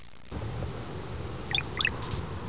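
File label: brown-headed cowbird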